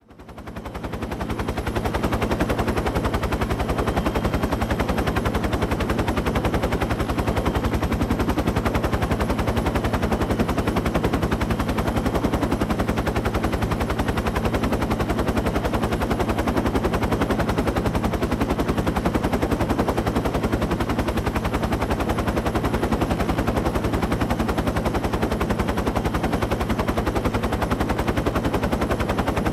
UH-1 Huey: In Flight. Good Prop Definition. Interior / Exterior Use; Mono